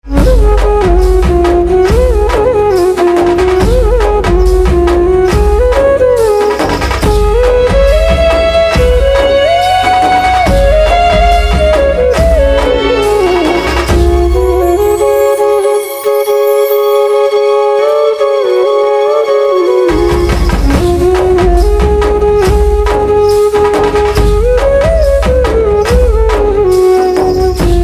Flute Instrumental Ringtone; devotional instrumental ringtone

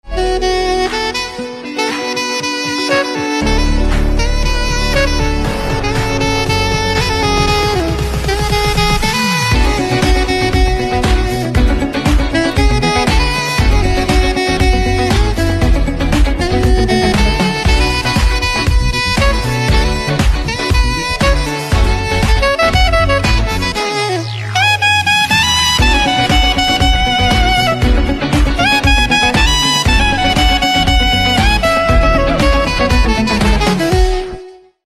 Saxophone Instrumental Ringtone

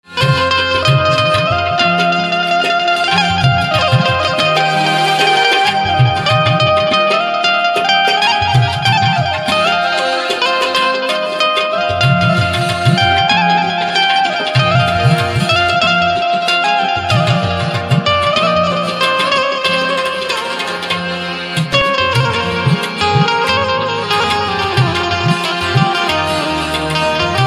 Banjo Instrumental Ringtone

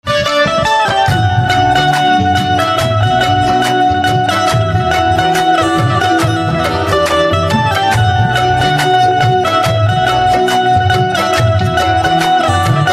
Banjo Instrumental Ringtone

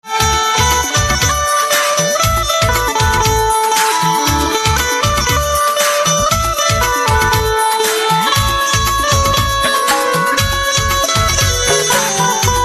Banjo Instrumental Ringtone

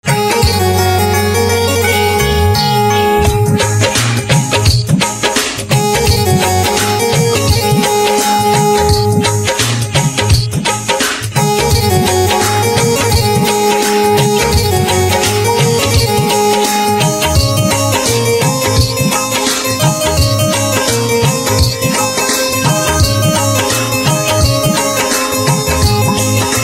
Sitar Instrumental Ringtone

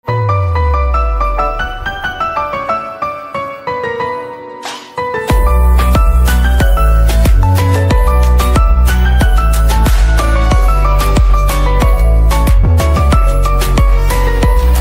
Piano Instrumental Ringtone

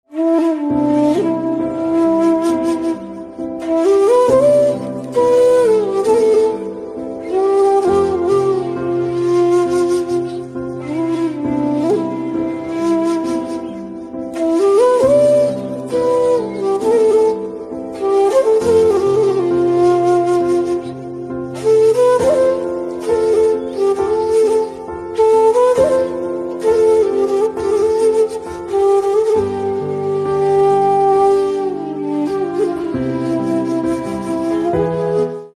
Flute Instrumental Ringtone

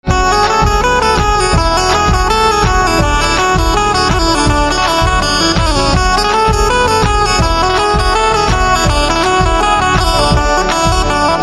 Banjo Instrumental Ringtone

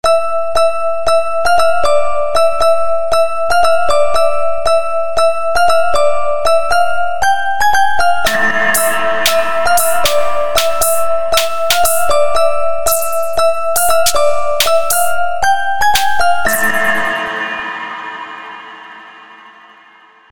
Theme Xylophone Instrumental Ringtone; KGF

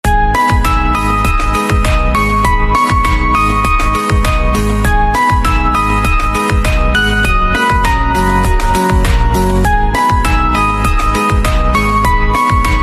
Xylophone Instrumental Ringtone

